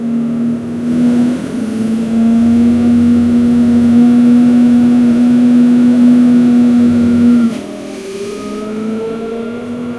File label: looping brake sound at sp, drift